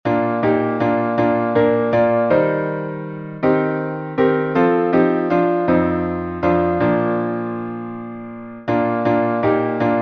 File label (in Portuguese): salmo instrumental